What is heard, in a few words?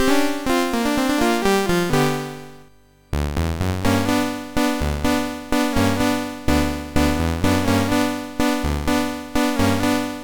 Pianobar, sid MOS6581R3; hvsc